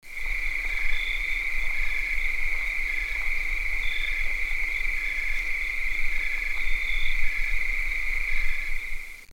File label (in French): Criquet